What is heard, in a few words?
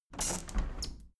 window handle plastic squeak close regular; objekte; fenster